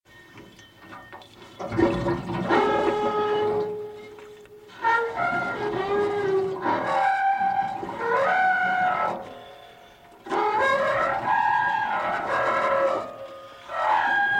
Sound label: Tromba Mariner